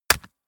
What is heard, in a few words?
gun shot silencer wrist